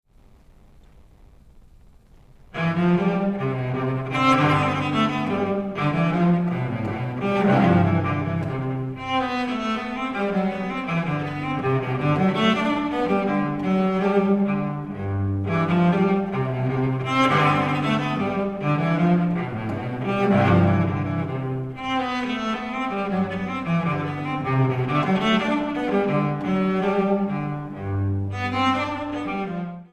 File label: Suite for Solo Cello No3 in C Major Bourree I II LIVE; JS BACH